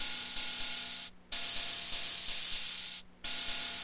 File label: Ambient House Open Hat 125BPM